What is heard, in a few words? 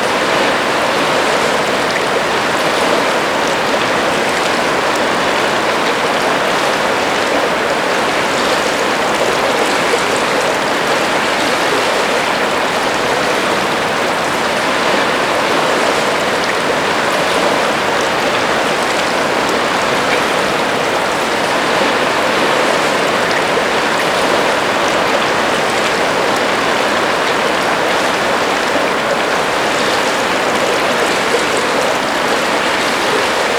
Outer Waters Loop